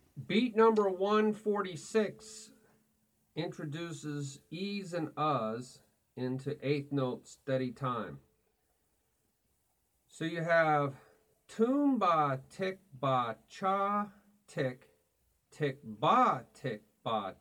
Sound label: Beat 1 e a 2 3 e a 4 Toom Ba Ti Ba Cha Ti Ti Ba Ti Ba Cha Ti